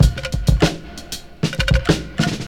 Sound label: 97 bpm drum loop sample A sharp key, kick tuned to the A# note